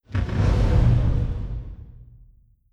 distance god ray hit layer; apocalypse

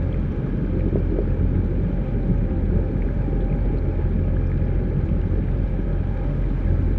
colossus muzzle; aquatics

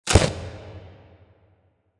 kaiju adult chomp; biogenesis

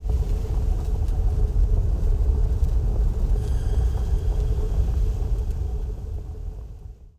planet heatup; stellarites; Stellaris; guardians

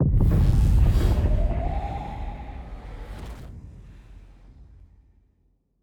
ship terralize; ships; azaryn; Stellaris; paragon

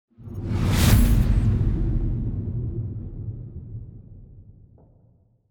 battleship move; aquatics

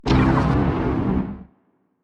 confuser laser hit, weapons; biogenesis

